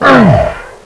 pain hurt; metatronic

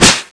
spider skill start; arachne

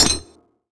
revive gun clip out, weapons

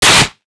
sg552 claw, weapons; buff sg552ex

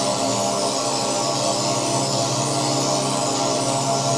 idle pull pin, weapons; divine titan

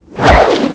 whip sword slash

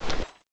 chain mg draw, weapons

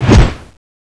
axe slash, weapons

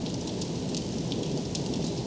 fiveseven fire loop, weapons

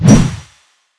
mace slash; weapons

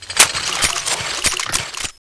cannon reload, weapons

